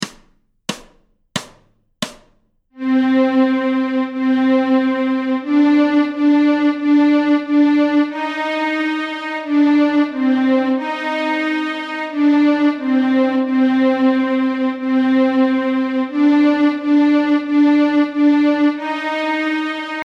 Stråk C moll, Nyckelharpa; Danmark; A-strängen Steg 1 Två toner i dur

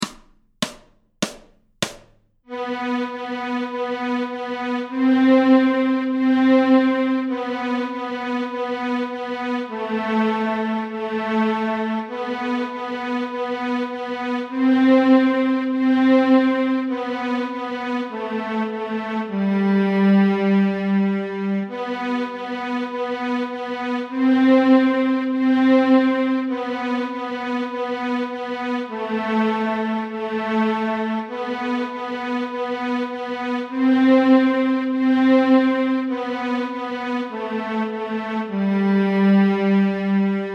Cowboysväng Stråk G, Fiol

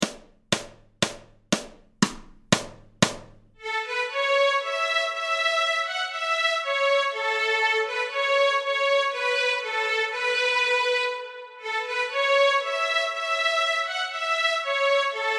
Oh Susanna Stråk A, Fiol; Notträning

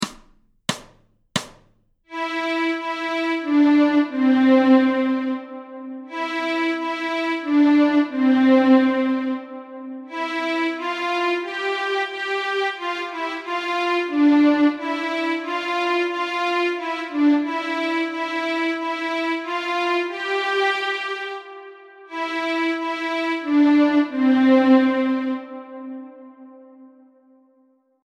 Stråk C, Nyckelharpa; Notträning

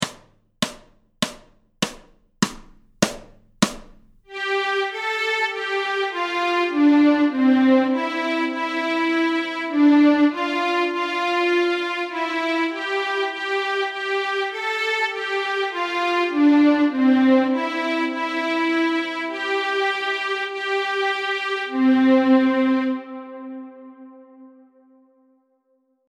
Stråk C, Nyckelharpa; Törnrosa; Notträning

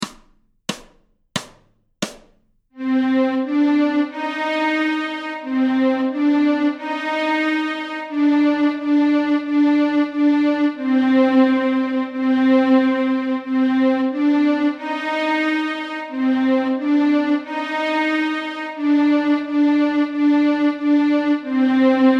Ryssland Stråk Cmoll, Fiol

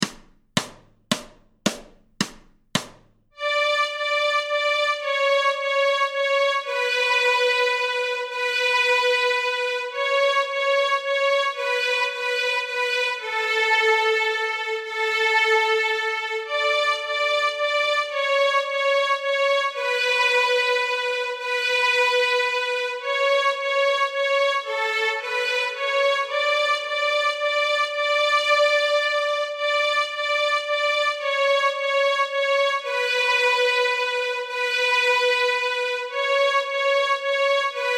Vals från Smedbacken Stråk D, nyckelharpa; A-strängen Steg 1 Två toner i dur

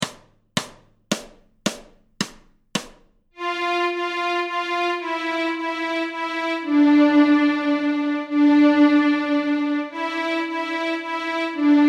Vals från Smedbacken Stråk F, nyckelharpa; A-strängen Steg 1 Två toner i dur

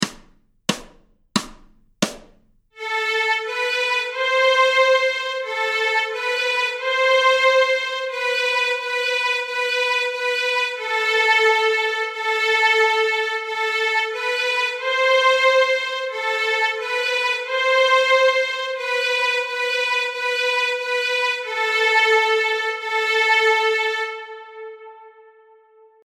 Stråk Amoll, Fiol; Ryssland; Notträning

